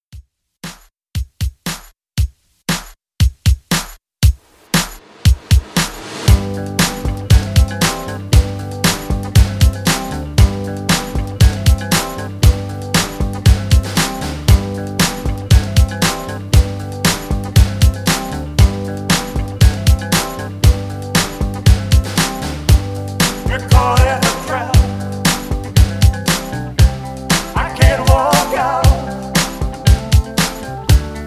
60s Country Re-Drum 117

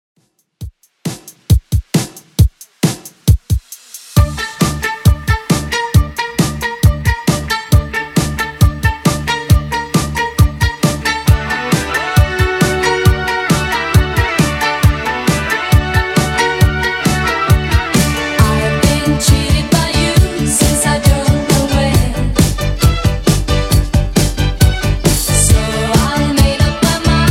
70s Redrum 135bpm

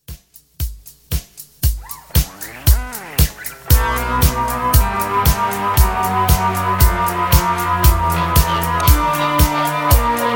Halloween Re Drum 116

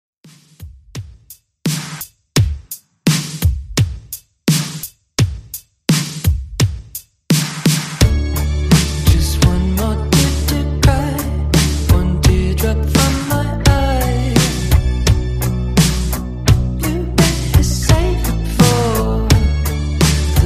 REDRUM 85BPM; remixplanet

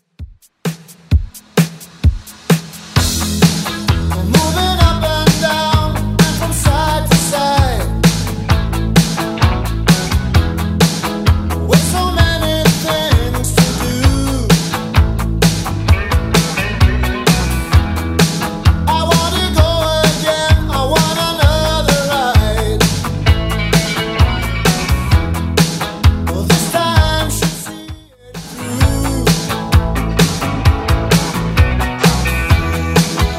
80s Rock, 130 bpm; Redrum

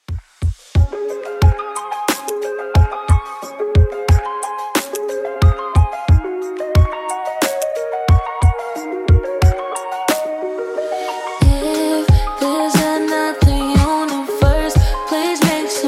Top40 Redrum 90bpm

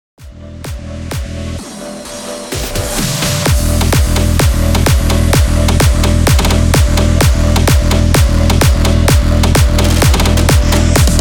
128 BPM

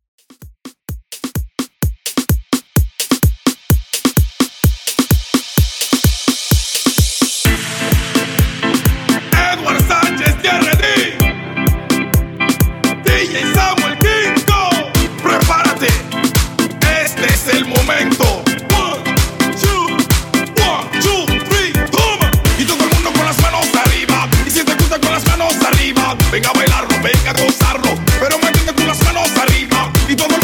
dance moombah, 128 bpm

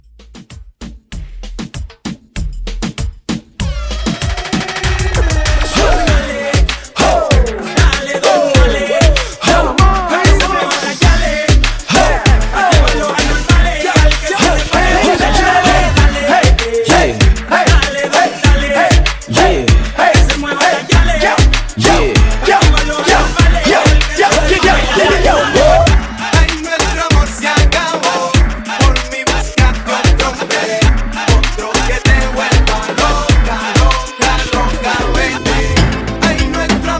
Latin Reggaeton Blend

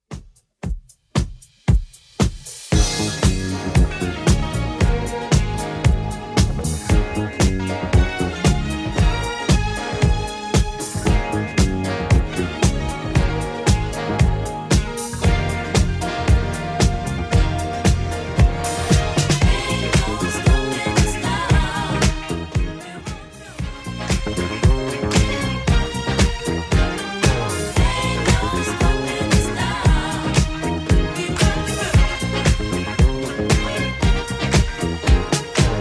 70s Redrum 115bpm

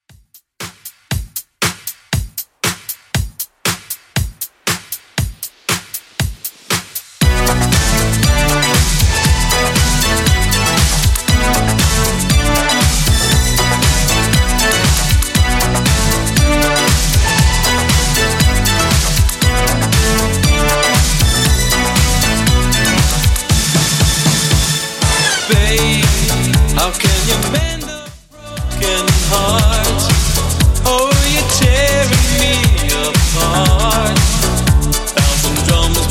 80s Extend Redrum 118bpm

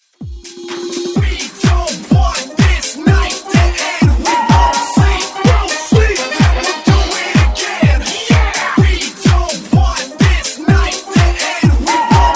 Dance; Redrum

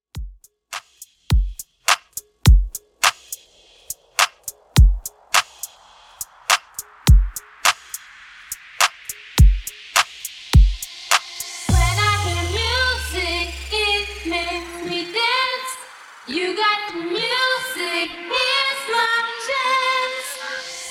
Epic Intro

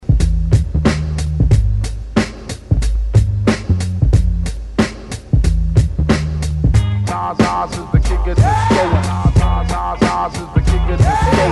90s Intro 91Bpm; DJDOLLS

